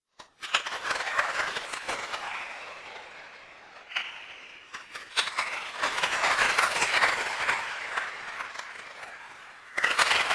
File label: applause